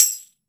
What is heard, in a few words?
TAMBOURIN; AKAI S-Series CD-ROM Sound Library VOL-5